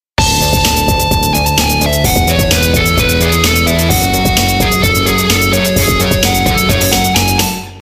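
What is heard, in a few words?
electric guitar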